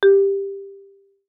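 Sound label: Marimba rubber fortissimo G4; MIS Pitches - 2014; Percussion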